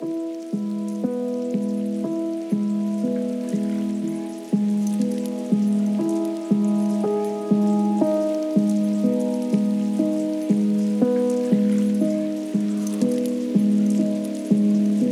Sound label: lofi hip hop